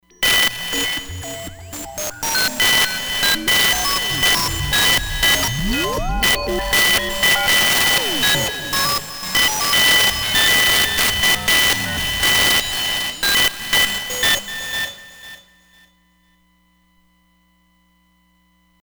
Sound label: Alien Radio, synth